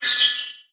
Multimedia Internet CD-Rom Flash Pop Up Short

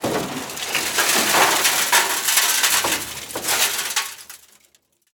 Crash Crane Crush Car; Crashes

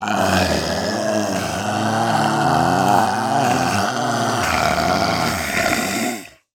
Horror Monster Growl Long

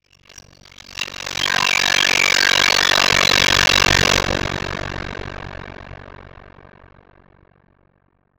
Transition Electrical Distortion; Adobe; Production Element

